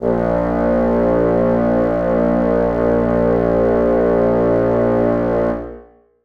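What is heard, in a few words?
bassoons sustain a#1; Sonatina Symphonic Orchestra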